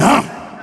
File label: huh male, Voice